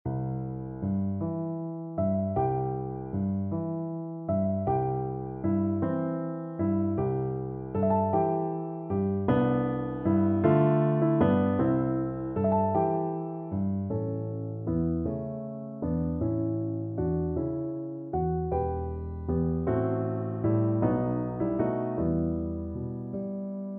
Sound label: Mary's Lullaby (Maria Wiegenlied) - The Shepherds' Song at the Manger for Oboe